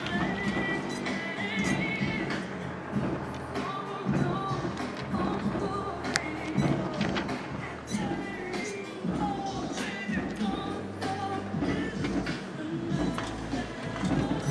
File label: Blown bass bar